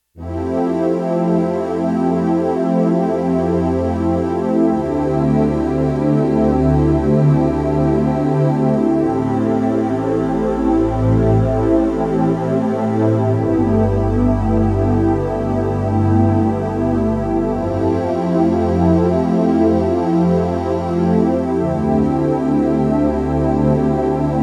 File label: Roland JX-1 Syn Choir B; Factory presets; Additional factory patches